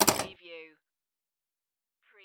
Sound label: Pinball sound, bumper ka-klunk